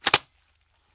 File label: Zombie guts Wet Chamois; Doom; Zombies